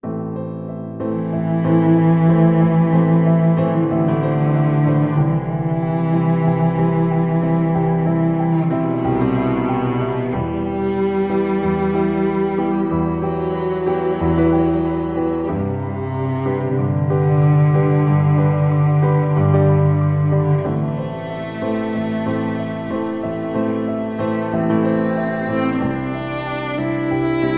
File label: Dramatic